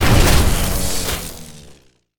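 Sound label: Trap Laser Turret death; monster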